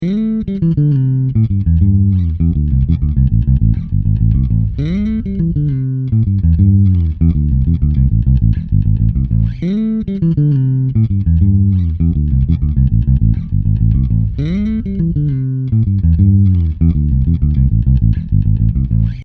svt style sansamp

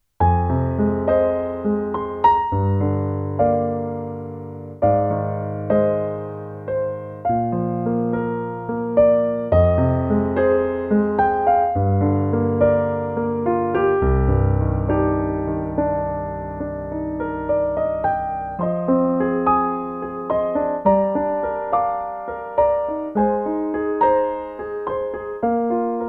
Roland FP 60X Ballad Piano

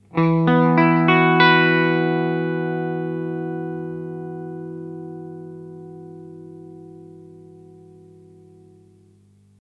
Mooer Tender Octave X2 bypass

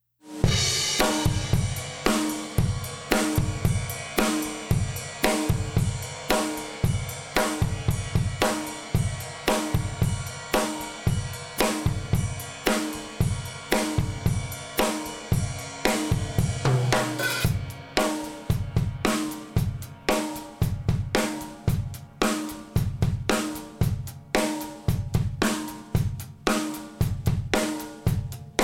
670 Plug In Drums MS; Jack Joseph Puig

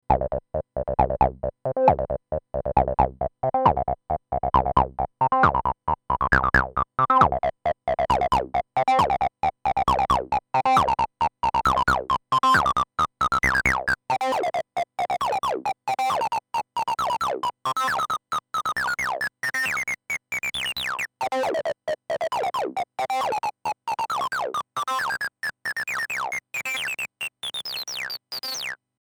Roland TB 03 Overdrive

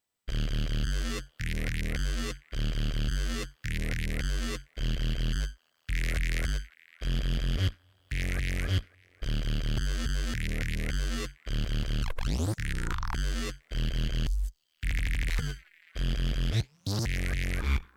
Loop Twister

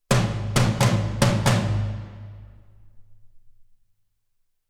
Atonal Drum Kit; Nucleus

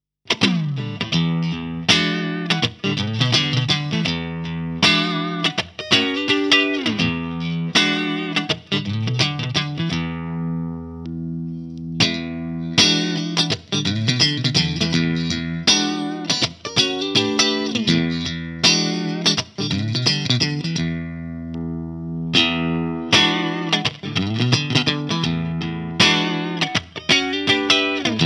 STRAT Clean; Fender AV II 57

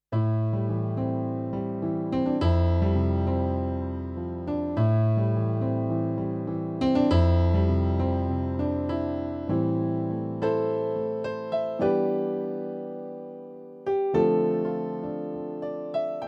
A Pianos

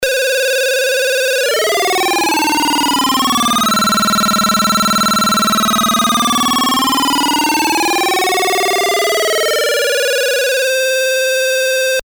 chiptune

